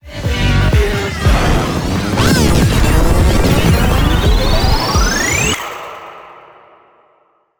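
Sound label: Music Sparkle Boss ending; Mindwave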